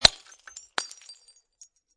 Glass Bottle Drop Empty Break, Impact, Glass Beer Bottle, Empty, Break On Cement